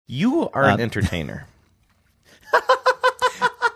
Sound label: saying you're an entertainer laughing